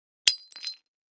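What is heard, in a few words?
Large Tile Square Pieces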